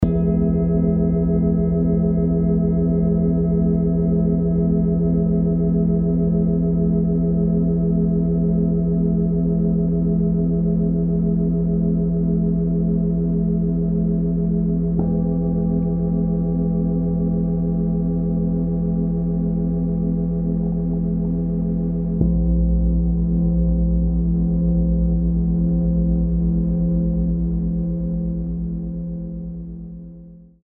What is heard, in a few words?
Sound Meditation; Sound Meditation No. 1; klang-meditationen; Winter Solstice Wintersonnenwende